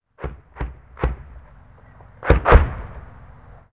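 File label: explosion medium; ModernWarfare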